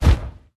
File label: Backstab Poof; Dark Army